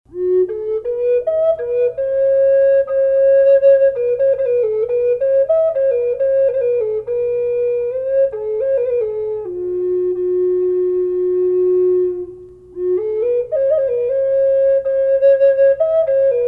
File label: reverb f sharp; jaguar; collector flutes